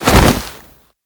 clothes shuffle; tressa

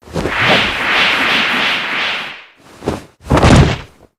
breakdown

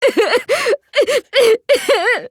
cry

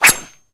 knife

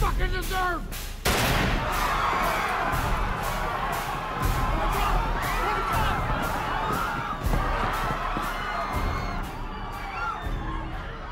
shoot; joker

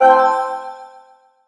sad shock

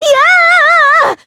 "yee-ahhh"; usami and monomi

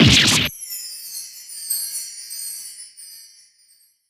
breakdown

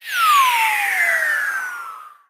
slide down; plvsaa

